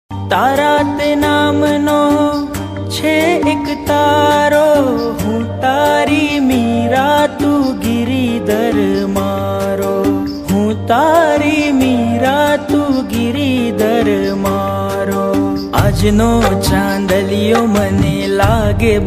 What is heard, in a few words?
gujarati ringtone